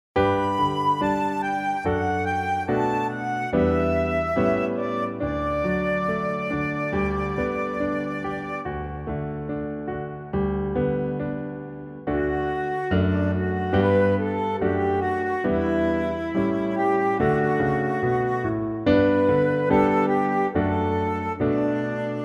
Pista con melodia instrumental

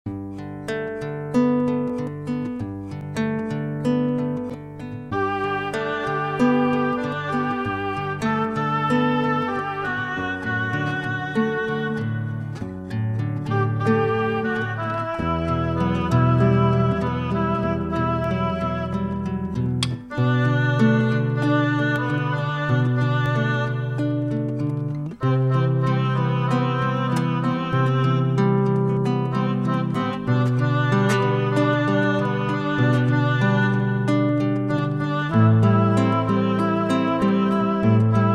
El Padrenuestro Pista con melodia instrumental

